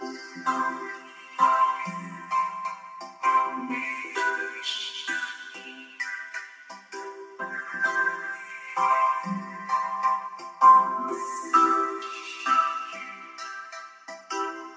130 euphoric vocode loop, synth and electronic loops